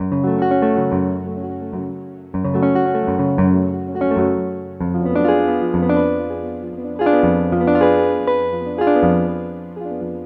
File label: Synth Guitar; Sonic Foundry (Sony Creative Software); Mac Money; Guitars